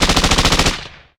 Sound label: Gun